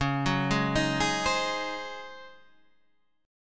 Db Major 7th Suspended 2nd Suspended 4th, Db, Guitar, Standard; 9,9,6,8,9,8; DbM7sus2sus4 arpeggiated; JGuitar